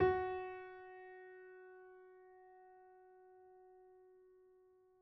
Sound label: F#4, Grand Piano; bitKlavier Grand; Piano Bar